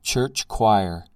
church choir

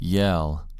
yell

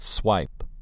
swipe

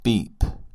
beep

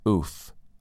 oof

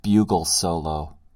bugle solo

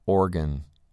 organ

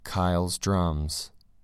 drums

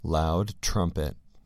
loud trumpet

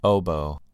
oboe

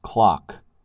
clock

